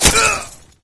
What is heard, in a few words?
damage, player; Source Engine; dod